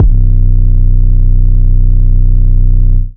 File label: Muuch 808; drums; single hits; Khezie 808s